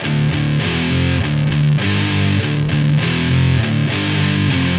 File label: Electric Guitar, Loops; Guitars